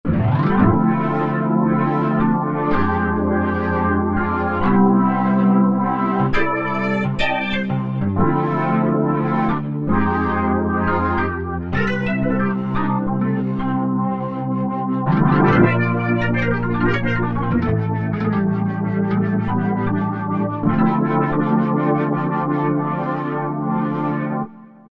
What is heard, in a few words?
Stereo Organ, Roland SR-JV80-01 Pop